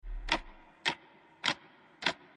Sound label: Bullet Time Cycle; OvertakingLegend